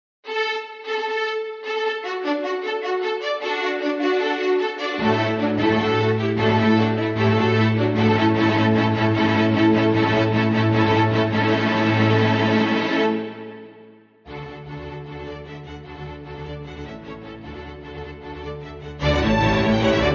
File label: String Orchestra; William Tell Overture